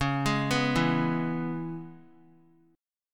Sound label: Db Minor 7th Db down, chord; arpeggiated; JGuitar